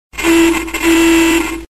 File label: Car Sound